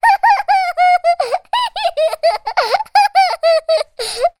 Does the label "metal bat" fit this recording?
No